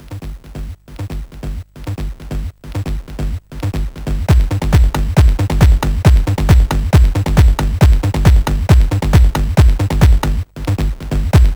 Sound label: Acid sid CSG8580R5; Acid Flashback